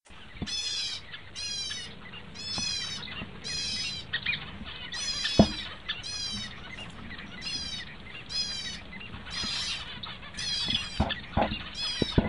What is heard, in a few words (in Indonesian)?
suara owlfinch